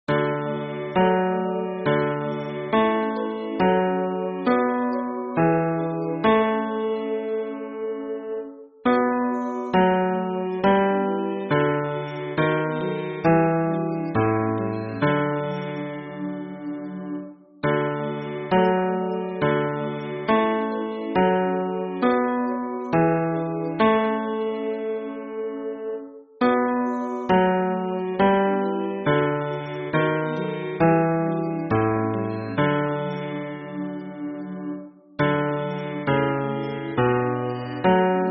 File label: hymn bass